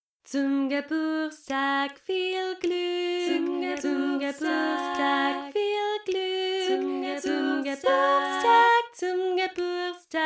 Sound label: Happy Birthday Song in German